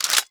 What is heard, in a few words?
ks23 pump back; weapons